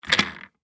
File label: Computer Chair, Computer Chair Wheel Spin; Office; Blastwave